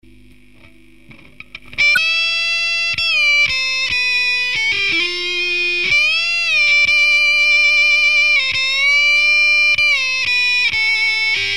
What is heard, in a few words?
Solo